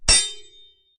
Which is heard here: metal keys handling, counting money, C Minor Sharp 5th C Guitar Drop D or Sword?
Sword